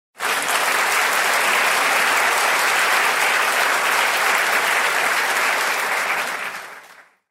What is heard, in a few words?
audience clapping